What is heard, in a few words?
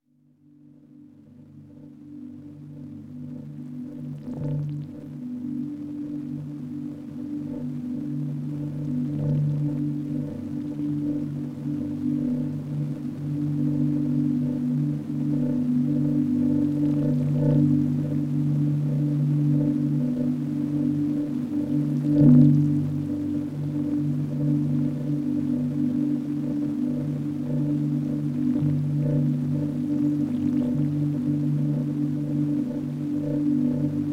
She was singing the melody